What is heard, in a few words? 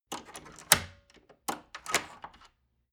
wood door old lock turn key long